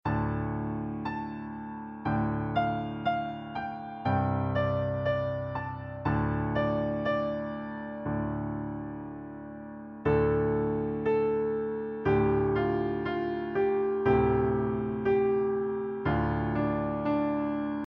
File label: hey hey watenay instr